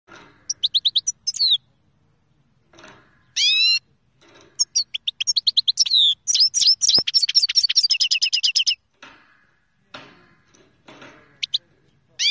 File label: sound original finch kermani